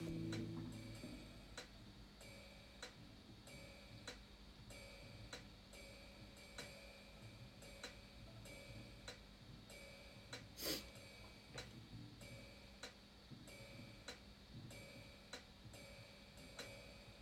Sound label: small riff no disto